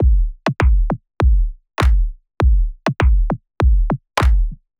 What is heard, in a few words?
Electronic Kick Dry